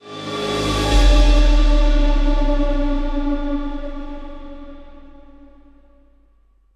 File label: Sting Death